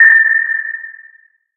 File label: ping